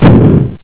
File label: fan; spacehulk